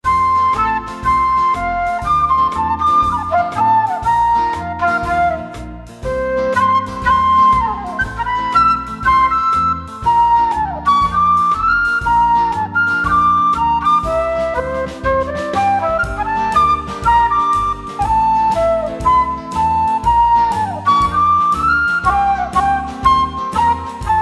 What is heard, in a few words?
Flute Solo